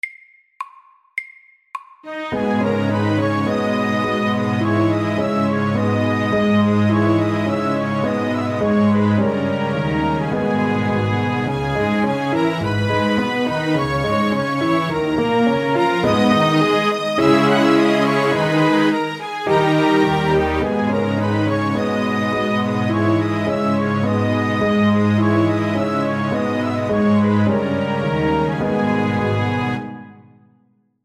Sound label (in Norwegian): Old French melody FORT